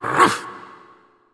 Roar Attack

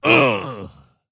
wound; Skadi The Ruthless

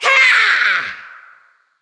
Creature Attack Medium; Fjola

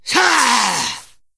Attack; Ionar

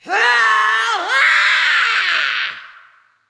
Attack Heavy, Creature; Fjola

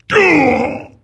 Wound Medium; Jaraxxus

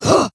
Attack; Tenebron

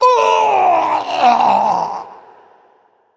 Death; Creature; Bolvar Fordragon

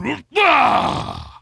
Attack Medium; Putress

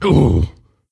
wound; Moorabi

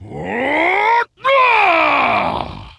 Attack Heavy; Putress

